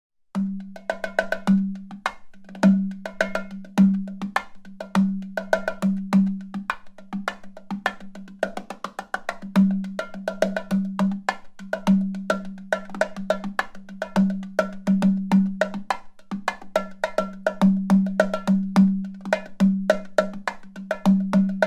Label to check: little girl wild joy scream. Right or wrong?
Wrong